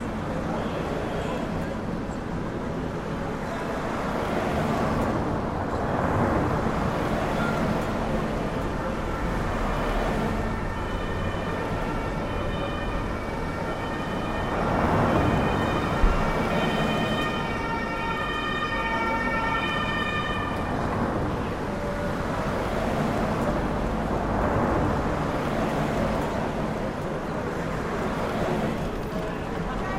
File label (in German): Atmo Stadt Strasse